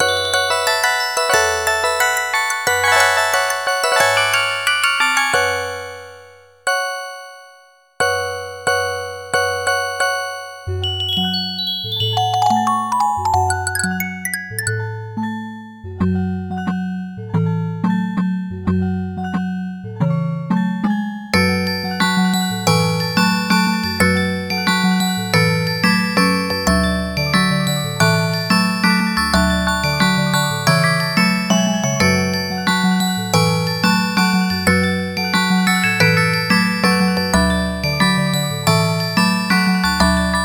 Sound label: Musicbox; Bonus